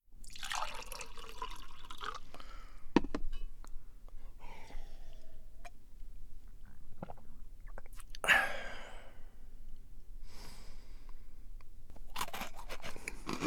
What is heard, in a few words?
Drink and Eat